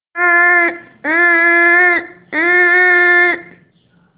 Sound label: little honky